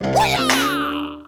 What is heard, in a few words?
specialmission skills tail slap